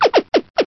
Communicator; Cheesy Lo-Fi Sound Effects

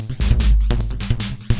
150 insectile, drum loops and breaks; 141 to 160 bpm

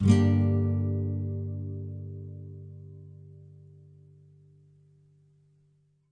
Nylon Chord G min; Nylon Guitar Chords

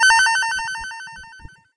bonus; pulsar

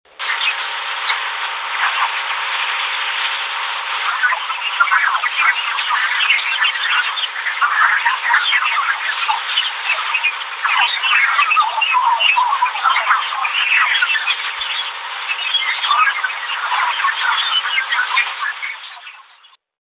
earths aurora, Real Outer Space Noises

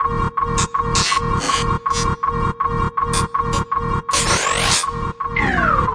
161 alien fx tone beat, drum loops and breaks; 161 to 180 bpm

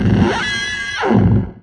Electro FX; Ueb HE Electro FX

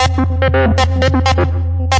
Synthesizer Middle C Notes, Lead

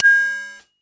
FM Cymbal, harsh; Harsh FM Cymbals

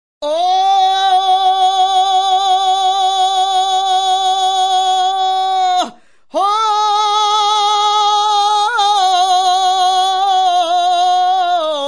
deep india, Indian Singing; VOCALS and SPOKEN WORD

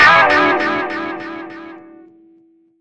guitar echo out; Melodic Stabs and Hits

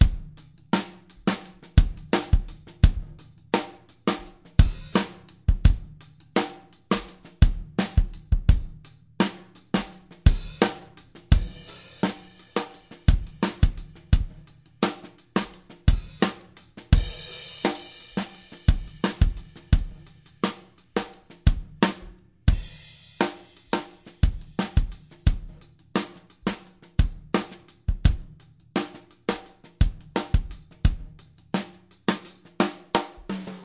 085 bpm slinky beat, drum loops and breaks

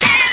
horn hit; Punches Hits Discoblasts

